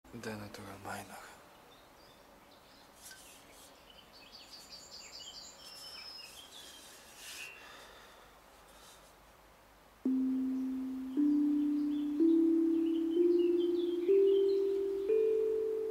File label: D natural minor